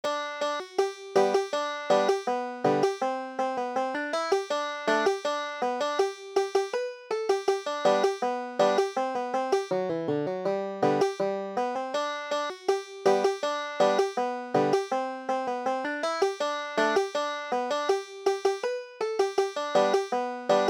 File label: banjo